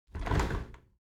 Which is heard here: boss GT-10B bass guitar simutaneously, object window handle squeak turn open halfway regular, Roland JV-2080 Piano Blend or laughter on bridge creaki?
object window handle squeak turn open halfway regular